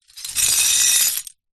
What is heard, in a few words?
impacts dish ceramic pieces drag ground in cloth bag